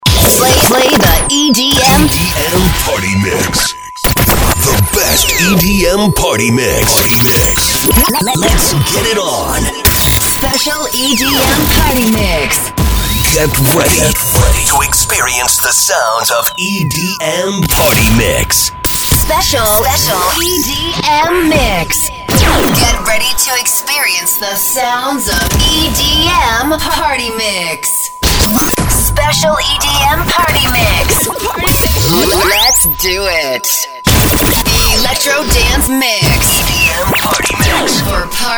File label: EDM DROPS